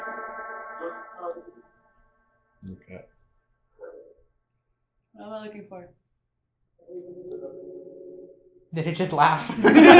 Tattoo Shop Phasma Box; After Hours Tattoo Studios – Return Investigation 2024

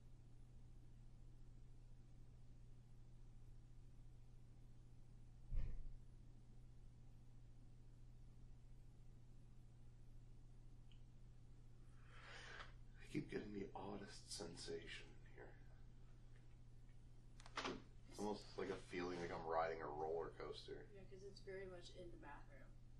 EVP

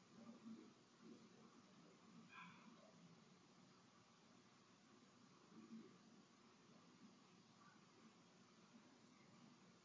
Empty Room; historic rainworth lodge investigation

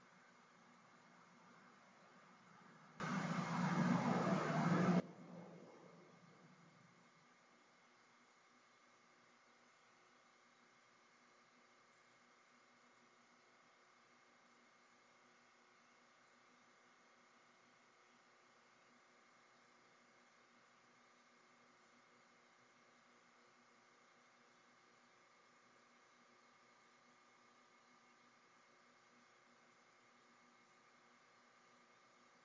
Chapel Static

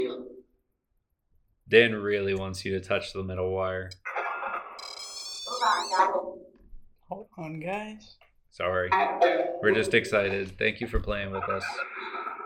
Tarot Card Room Phasma Box

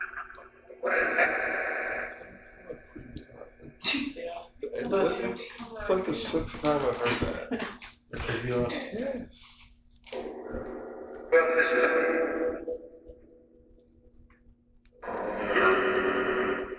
2nd Floor Dark Wing Phasma Box

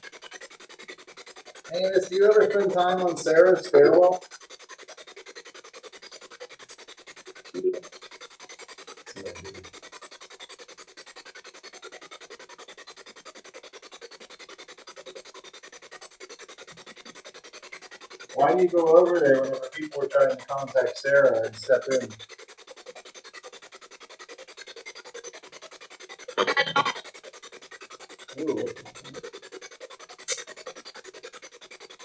Janitor Room Basement Spirit Box; poasttown elementary school investigation

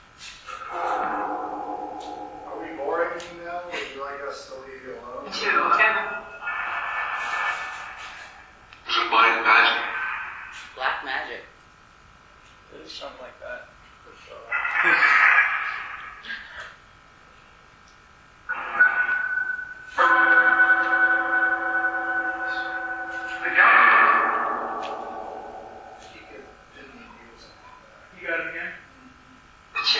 Carriage House Phasma; the castle at mount savage investigation

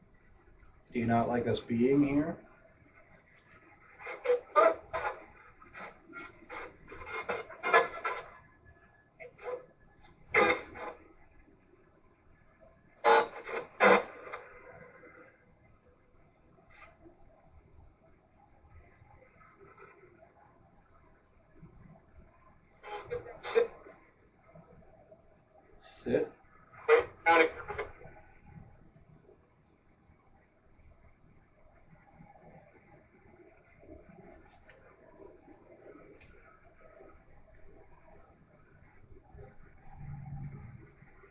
Master Bedroom Portal; ross house investigation

